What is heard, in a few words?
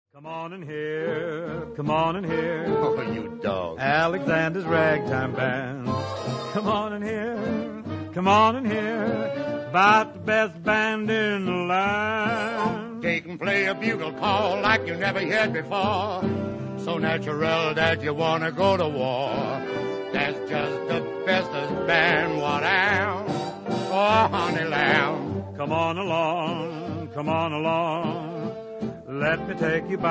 Ragtime Words; musical